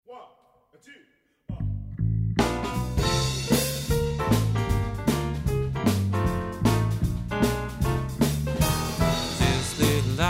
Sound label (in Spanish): LET IT SHINE - BAJOS; ADIOSES SHOW CHOIR; CALENTAMIENTO